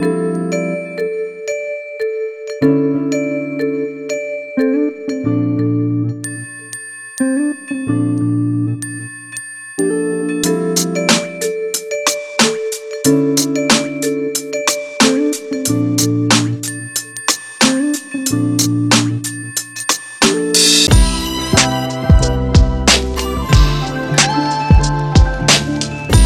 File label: Lofi mood